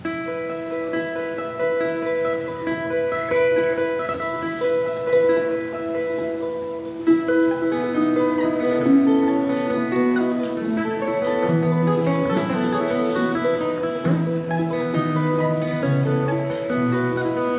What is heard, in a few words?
guitar